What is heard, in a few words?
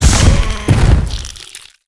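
beetle spawn; monster; guardian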